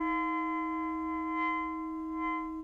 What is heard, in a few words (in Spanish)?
Multiarmonicos